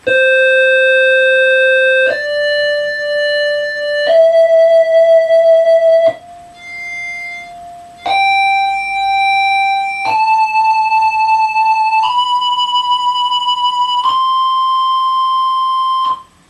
scale insieme; Proviamo ad ascoltare le tre scale simultaneamente

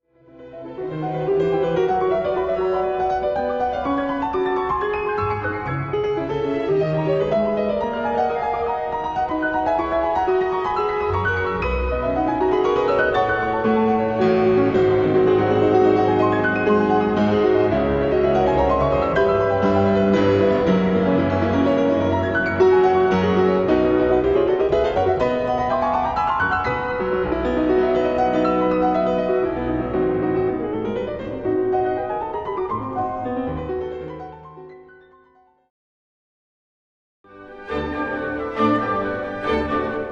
pianoforte

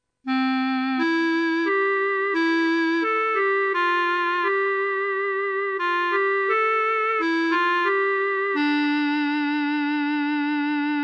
melodia

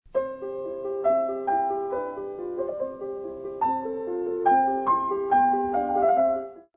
basso albertino; la mano sinistra (pentagramma in basso) esegue una figurazione di basso albertino; Sonata in Do Magg. KV 545 di W. A. Mozart

